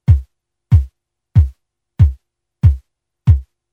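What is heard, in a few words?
Bass Drum Soundbleed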